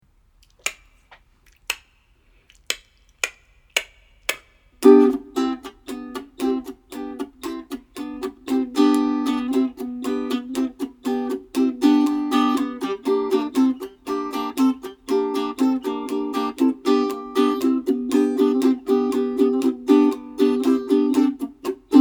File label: G Blues rock